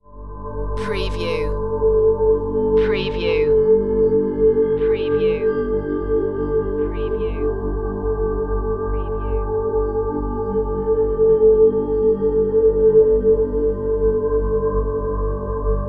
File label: eerie tale